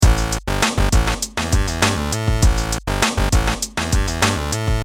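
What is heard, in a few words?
drum bass korg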